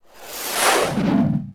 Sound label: Boost Hit Ramp Truck; Cars; XBOX